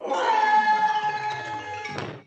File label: old gopher scream